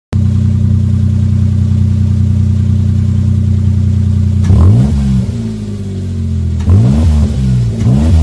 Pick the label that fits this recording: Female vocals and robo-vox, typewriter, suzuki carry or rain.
suzuki carry